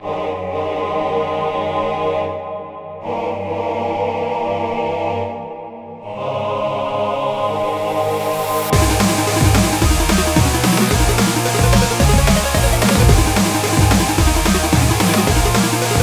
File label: Hellroll (Instrumental); Friday Night Funkin' The Full Carol